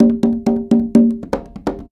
Percussion Loops, Bongo & Conga; Houseworx

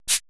80S DRUM SET

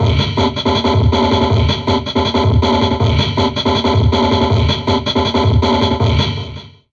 Drum Loops Crazy Processed; 160 CHAOT; USB Soundscan vol.07; AKAI

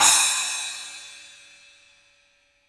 Studio Cymbals; E-MU Producer Series Vol. 5 – 3-D Audio Collection; 3D Percussives

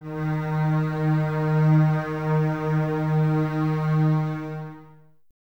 BSS D#3, String Ensembles, Basses, f Full; Miroslav Vitous; 90 s Sample CDs

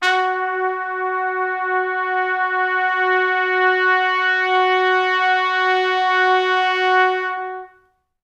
F#3 -L, Pop Brass; Best Service; ProSamples vol.19; AKAI

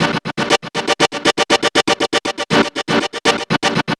Progressive Synth, Trance

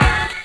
BRASS HIT; E-MU Formula 4000 Series Vol. 5; Protozoa; Phatt Instrmt

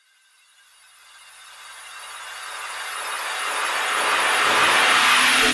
Sweep, Fx, Sweeps, Trance; Zero-G Trance Inducer

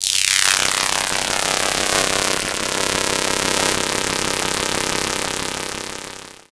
Steepsweep, Weird; Industrial

